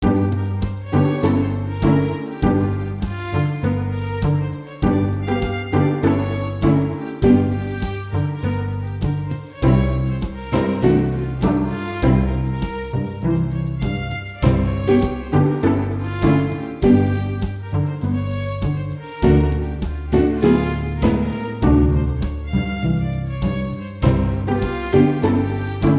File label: tango blues